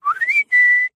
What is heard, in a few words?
whistle